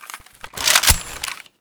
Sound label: vintorez misfire, weapons